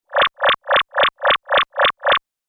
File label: Laser Repeating Pulse; Laser Sound